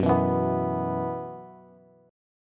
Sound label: F sharp 7th Suspended 4th, down, Bass; strummed